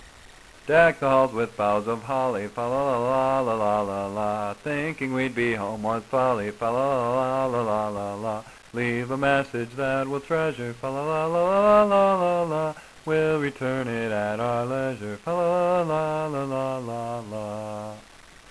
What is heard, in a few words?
Deck the halls with boughs of holly; Holiday favorites